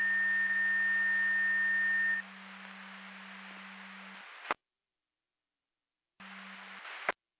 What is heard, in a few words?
Siren; Hamburg FD